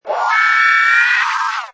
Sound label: Jurassic Creature, Pterosaurus jurassic creature screech: Fantasy monster or alien creature; pterosaurs or pterodactylus screeching; Lynne Music